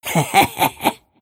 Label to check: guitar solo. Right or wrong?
Wrong